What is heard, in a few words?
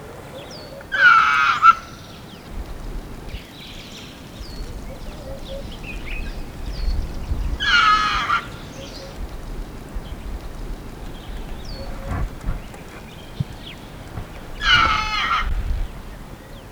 Procavia capensis alarm